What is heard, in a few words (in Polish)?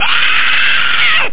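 krzyk, scream; jurajski sen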